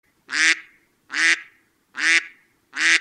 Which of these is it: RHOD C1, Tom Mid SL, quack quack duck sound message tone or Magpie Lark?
quack quack duck sound message tone